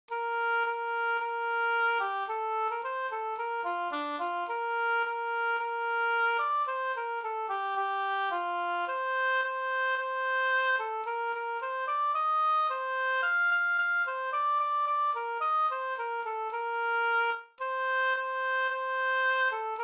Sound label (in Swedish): vismelodi; Gustafs skal